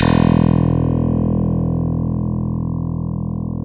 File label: KEY MKS P3, P3 + Rhodes